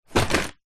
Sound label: household vacuum cleaner dyson v7 stick set down carpet